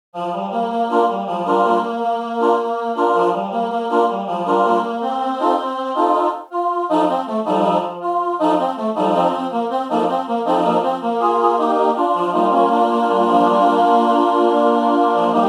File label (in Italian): tutti